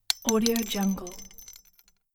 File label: jingle bell drop